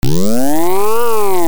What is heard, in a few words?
ALARMS